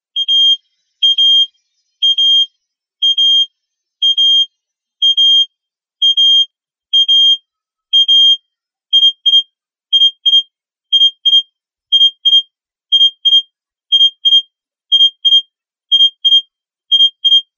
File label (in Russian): Морзе 'А'